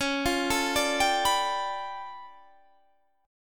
D Minor Major 13th, Db

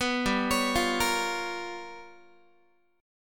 G 7th Sharp 9th Flat 5th, Guitar; G7#9b5 arpeggiated; JGuitar

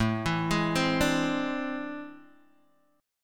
A Minor Major 11th A, Guitar, DADGAD

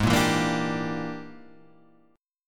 E 7th Sharp 9th Flat 5th G sharp, chord

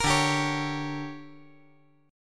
D Major 7th Suspended 4th Sharp 5th

